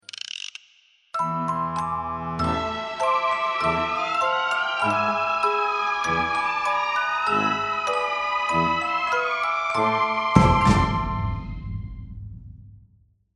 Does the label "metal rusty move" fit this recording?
No